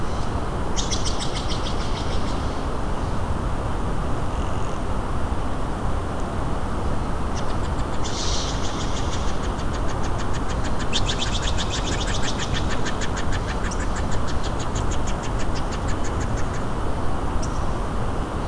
Watrfall; SuperModels in the Rainforest

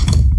thud

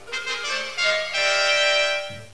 Trumpets; Buttons

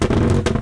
pop loop, car

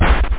door close

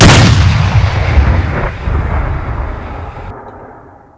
weapon fire tank; weapons; Spearhead Demo; MacFormat 2003 June

